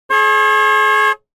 transport car horn beep med long blast

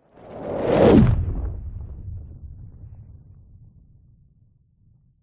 whoosh fast powerful; sound design; zapsplat

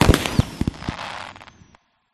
Glitch Factory Boom Crackle; Glitch Factory 01; sound spark

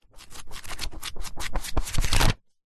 household book large thick pages flick

